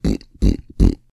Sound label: Pig grunting